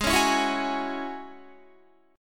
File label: Ab 7th Suspended 4th Sharp 5th down Guitar; Ab7sus4#5 strummed